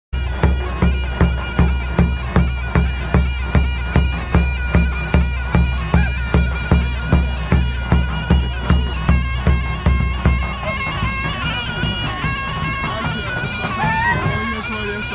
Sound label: timpani